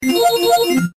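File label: Old Ring Notification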